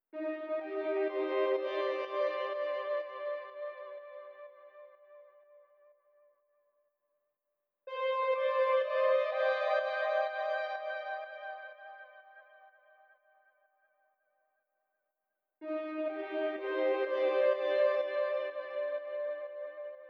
124 BPM C Min Synth; Cymatics; Rari; Destiny Gold Expansion

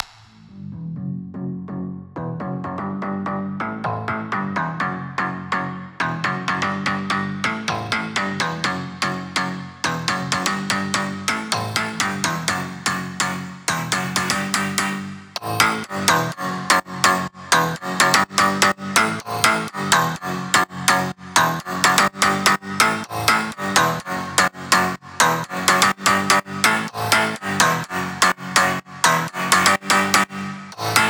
Bass WET SC, Future House 125 BPM A; Big EDM; Future House Tools; Construction Kits; Time

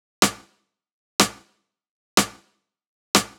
Big EDM Clap Loop, 123 BPM; Future House; Future House Tools; Big EDM Drum Loops

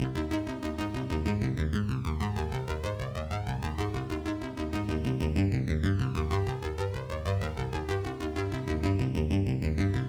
Synth, 095 Cmin

